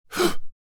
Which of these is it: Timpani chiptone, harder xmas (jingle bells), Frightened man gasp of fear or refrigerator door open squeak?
Frightened man gasp of fear